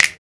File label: Clap